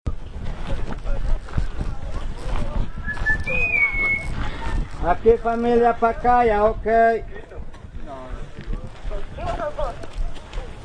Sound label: whistle